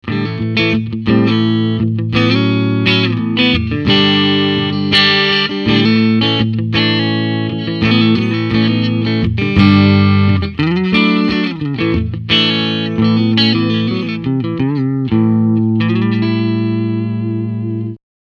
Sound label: orange th30c-bk clean